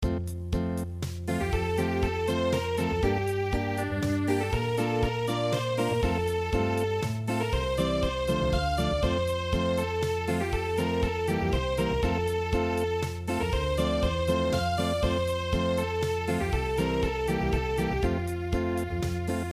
Unplugged; Ich hort ein Sichelein rauschen

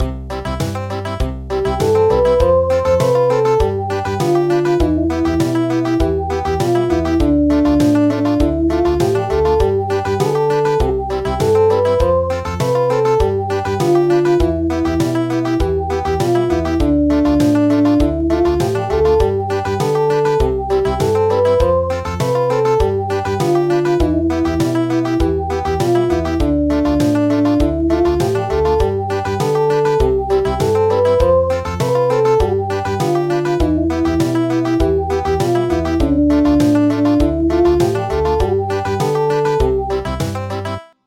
Country, midi; Good evening Kings

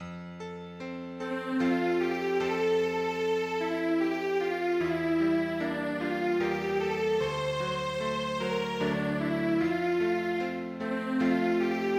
Healing Piano; midi traditional

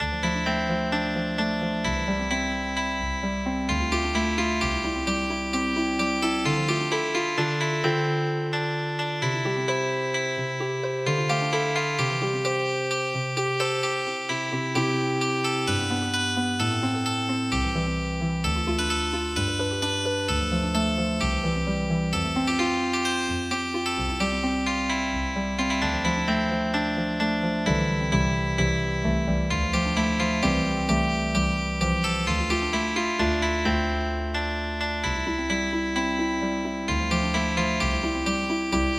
Song Healing Harp; traditional

